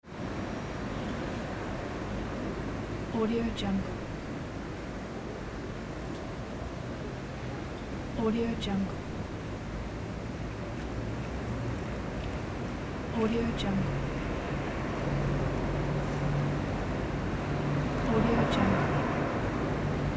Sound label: city